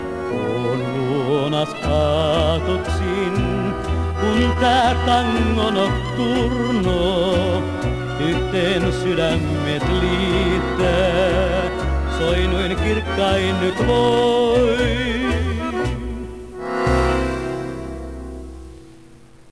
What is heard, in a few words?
Tango Nocturno